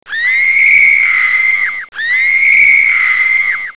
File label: scream